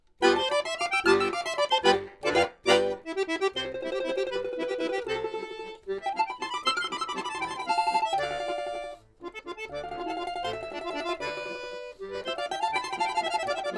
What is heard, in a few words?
domra bayan, stereo